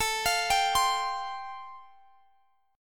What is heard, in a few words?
A Minor 7th Sharp 5th A, Guitar, Standard; arpeggiated; x,x,19,22,20,20; capo=2